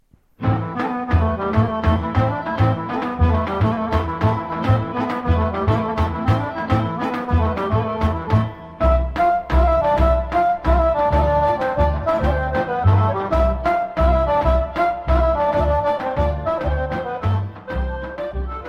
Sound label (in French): Ratchenitza - Bulgarie